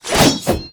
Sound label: Scythe attack; Karda Mahal Underground Temple; Devil Scythe